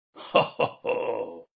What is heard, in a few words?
Laughing; Talking Ben